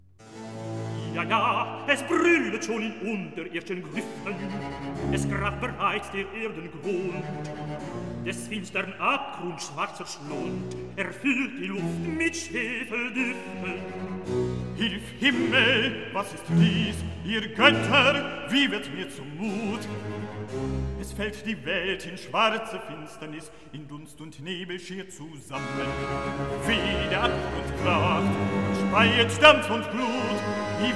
Recitativo; Gläubige Seele, Hauptmann' Ja, ja, es brüllet schon; Klassika; Handel Masterworks

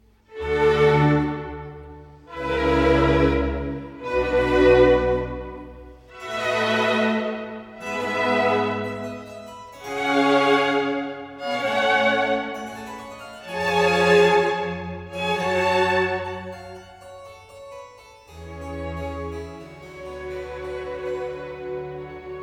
Concerto Grosso in A major, Largo e staccato; Handel Masterworks; Klassika

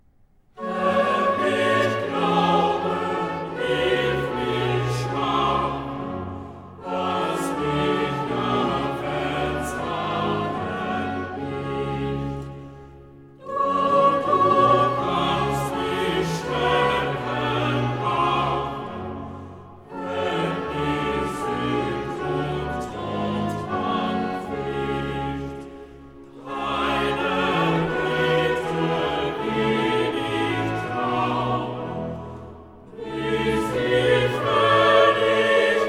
Choral (Chor); BWV 78; Herr, ich glaube, hilf mir Schwachen; Klassika; Bach, Johann Sebastian; Cantatas; BWV 078 Jesu, der du meine Seele

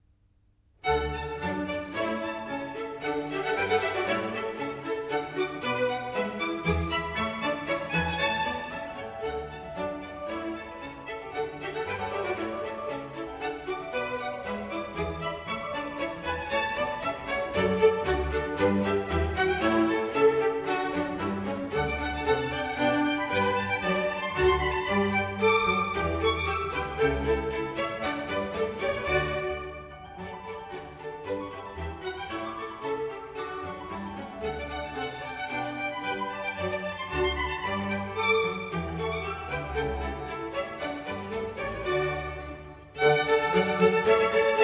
Concerto Grosso in D minor, Allegro moderato; Handel Masterworks; Klassika

